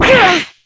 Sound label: damage